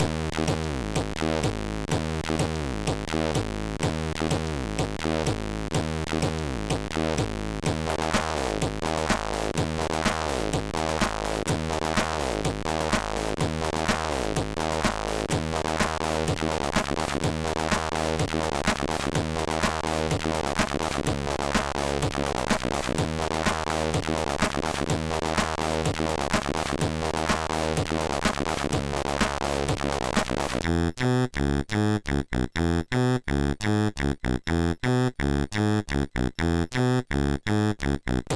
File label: Techno House, MOS6581R3